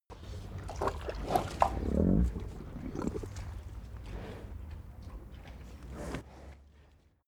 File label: cow eat breath